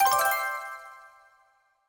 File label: win